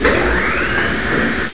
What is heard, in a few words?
Power Turn On; PC; MARIOSFUNDAMENTALS